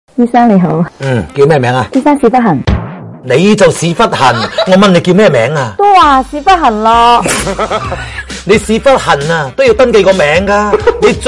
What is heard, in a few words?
laugh; breadradio